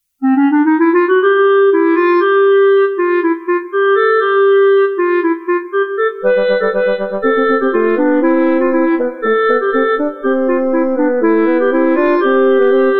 trio for woodwind

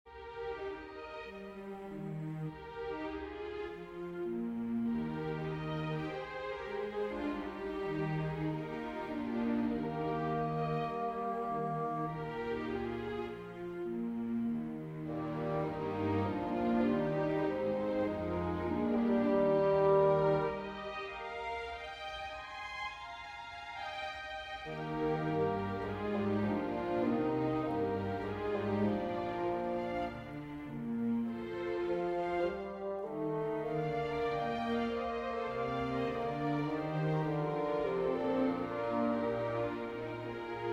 for bassoon, strings; Tears such tender fathers shed; Deborah

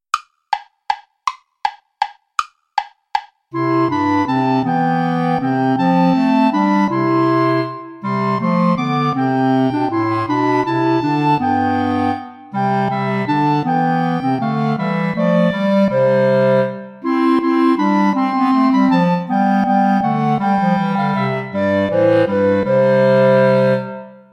play along without clarinet; vulpius melchior; good christians all rejoice and sing

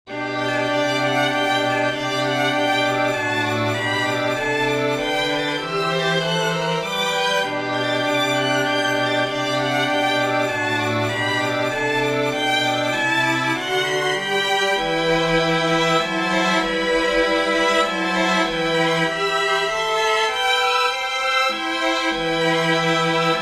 for string quintet; tchaikovsky piotr ilitch; the lark sings

